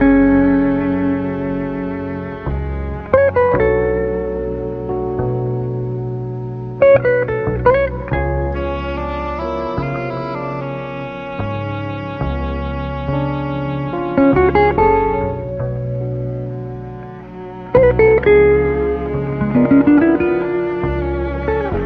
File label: guitar keys strings, Sad; Loops